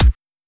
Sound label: drum hit normal; osu